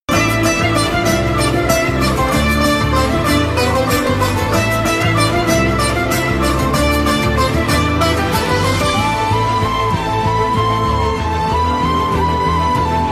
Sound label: love failure bgm; vikram